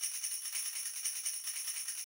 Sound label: Alternative 147bpm; hot lava; ios macos windows; Caldera